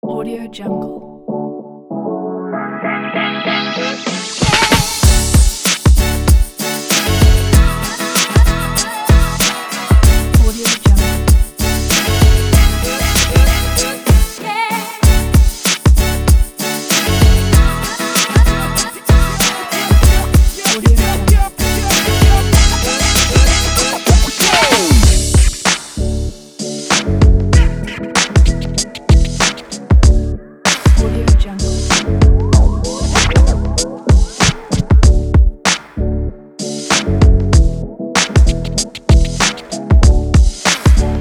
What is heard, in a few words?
energy hiphop